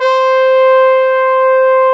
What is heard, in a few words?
French, SC55 brass